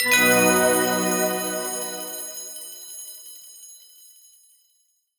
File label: Level Complete Ring Magi